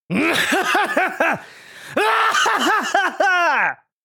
Evil laugh anime villain vocalization

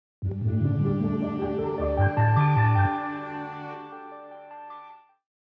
Playful modern logo intro

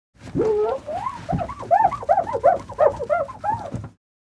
Mirror cleaning glass cleaning wipe

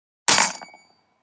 Game coin drop

